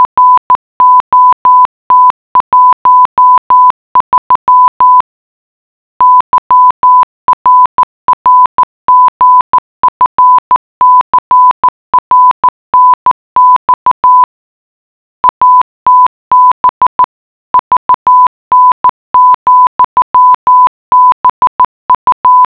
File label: Morse code